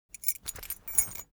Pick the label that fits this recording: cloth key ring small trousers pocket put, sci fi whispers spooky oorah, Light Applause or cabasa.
cloth key ring small trousers pocket put